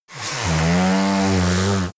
yeti nose blow long

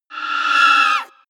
wraith attack; schreie

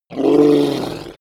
reptile choke call short

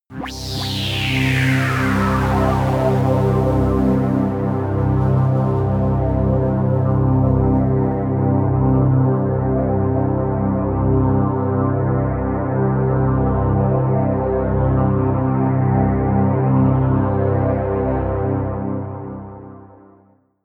pad sweep hiss; flaechen

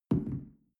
shell place on tablecloth soft; berufe; gastronomie; soundpool

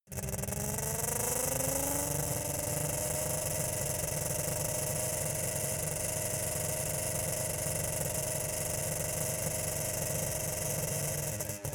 car saab 92 rpm high exhaust

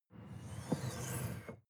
sports car ext bonnet hydraulic

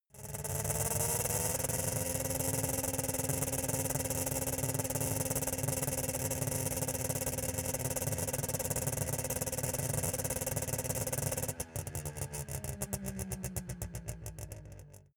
car saab 92 rpm medium exhaust

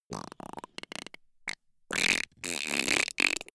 creature quack

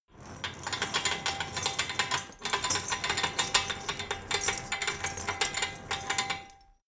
metal chain rattle long

